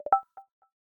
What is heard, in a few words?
send video message; iOS Sounds